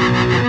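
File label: Guitar (metal); Sonic Foundry (Sony Creative Software); Ugly Remnants VOL-2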